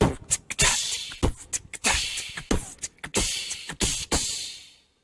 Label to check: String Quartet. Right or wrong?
Wrong